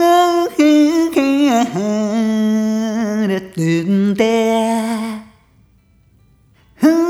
Rhythmic A

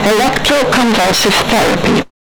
Electro Convulsive, Vocal FX, Distorted; Zero-G Smoke

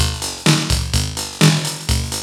LOOP, TECHNO; TECHNO-BOX; ELEC-LOOPS

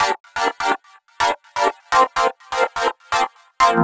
wherehouse pad D 125, synth loop; Zero-G; Soundclash; Nu Dance Electro

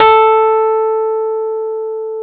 A 3, Electric Pianos; Wizoo

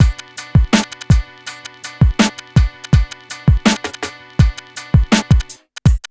BEAT, Grunge Hip Hop

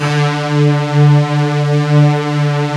BIG BUZ D2, SYNTHS; Kid Nepro; Elite

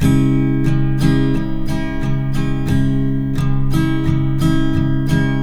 MIN D, Guitars; Spectrasonics; Hans Zimmer Guitars VOL-1

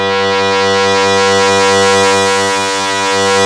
2600 E3, ARP 2600; Hollywood Edge - Giorgio Moroder Rare Synthesizer Collection

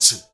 male solo, male noise

